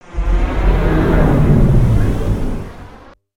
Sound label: zoom out